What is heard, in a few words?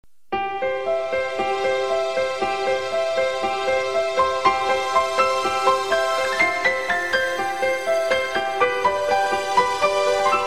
piano; stereo; rjfox